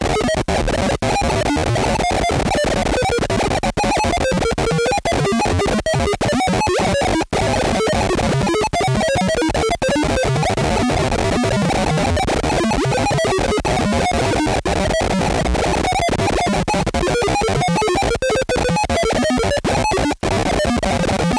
science fiction robot malfunction chaos random loop; Skyclad Sound